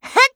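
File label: attack, Voice Line, Korean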